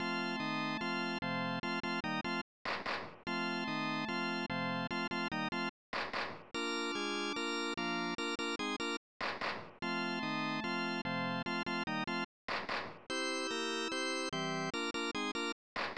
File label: Last Period Fanfare; NHLPA Hockey 93; SNES